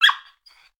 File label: dog cry; anemoi